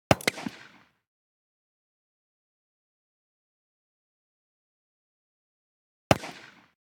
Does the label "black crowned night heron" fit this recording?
No